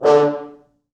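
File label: 4 French Horns, Horns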